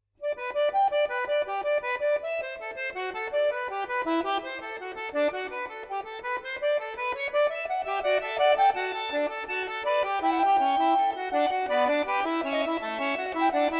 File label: Fugue in G major, accordion